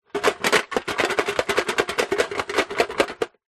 food toffees in tin shake; zapsplat

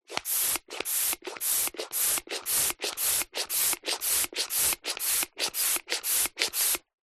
pump hand small plastic pumping valve perspective; household; zapsplat

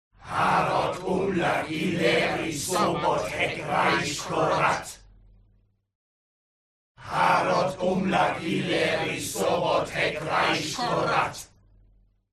CROWD Evoke Evil; HUMAN; smartsound

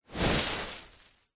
foley tree leaves branch whoosh impact designed

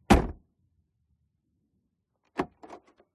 Door Citroen C2 Trunk Open Close; MKH8060

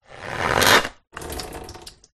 household squeeze mop squeeze wet drips

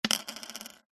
token plastic set down wood slight spin; foley

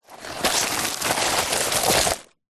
foley tree bark piece wet drag ground

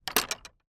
industrial wooden farm gate shut

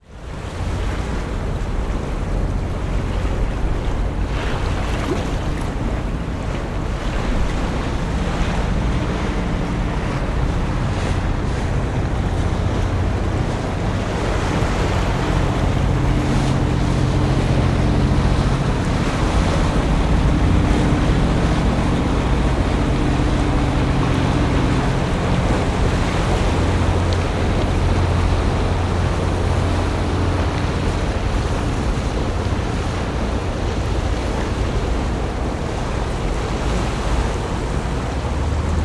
transport boat motorised pass by of harbour jetty

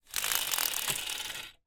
household blind venetian down

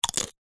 human eat bite single corn chip; zapsplat

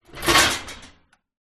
dishes in dishwasher push in

